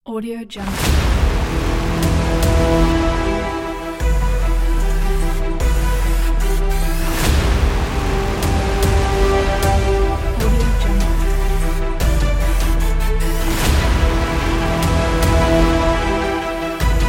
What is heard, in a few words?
epic heroic trailer